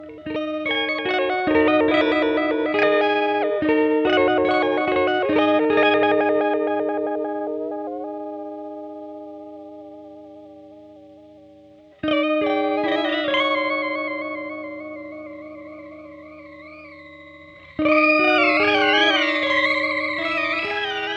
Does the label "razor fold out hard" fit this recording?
No